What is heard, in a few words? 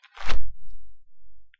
bandage retrieve; ifak; weapons